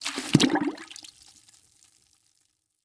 Liquid Water Mop Submerging In Water

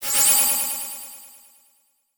Transition Delay Medium; Adobe; Production Element

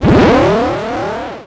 Title Transition Ascend; Production Element Title Transition

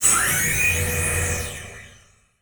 Transition Whoosh Alarm; Production Element

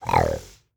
Animal Mammal Carnivore Cat Puma Breath; animals; AdobeCC

